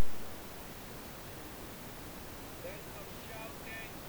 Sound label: broken microphone recording; Framework